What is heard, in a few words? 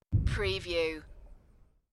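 Distant Artillery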